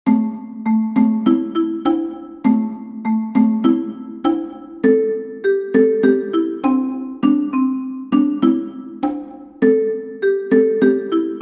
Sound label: Cajon; Land of the silver birch